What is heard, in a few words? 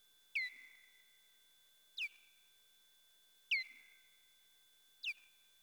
nyctnoct, bat echolocation; N. noctula; Echolocation calls of British bats